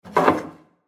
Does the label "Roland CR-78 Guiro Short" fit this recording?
No